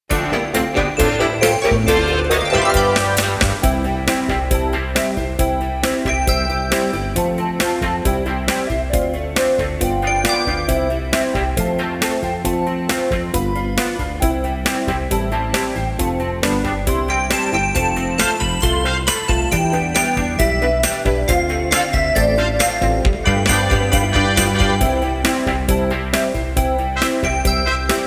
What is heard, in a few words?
original full karaoke